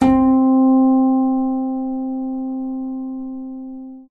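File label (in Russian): арфа нота C5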